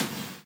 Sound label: impact